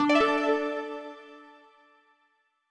Energyrecover D; super mombo quest

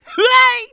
clown throw; Ringling Bros. and Barnum & Bailey; Wii

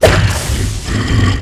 Boss Attack

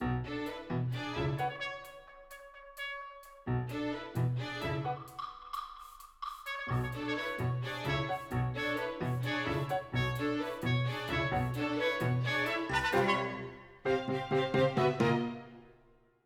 Field Battle

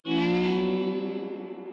Casse Feedback Music

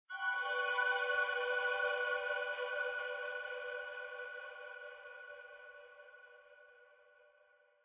Memory Success Stinger

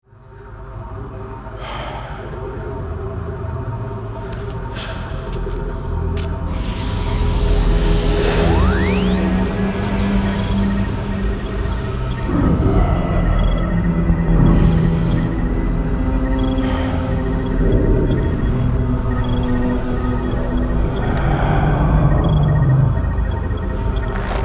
the arrival sfx music

